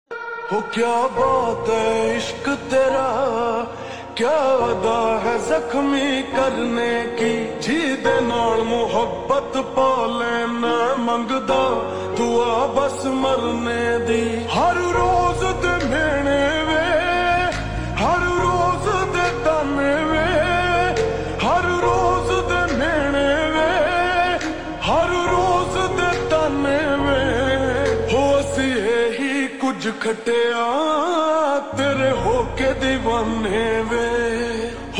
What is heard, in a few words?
Ringtone Slow Reverb